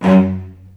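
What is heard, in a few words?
F#1, VCS arco, strings, R; East West; The Ultimate String Collection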